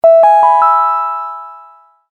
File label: Notification sound